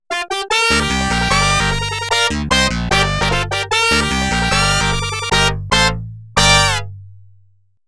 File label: RACE START; Taito Digital Sound Archives